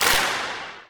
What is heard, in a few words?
Clap